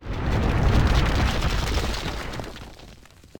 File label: cave-in; wesnoth